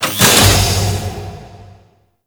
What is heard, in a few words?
Corpus Large Door Open; Warframe; Doors